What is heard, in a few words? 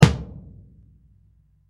kick thunder